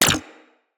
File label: Shield Impact Default; Warframes; Volt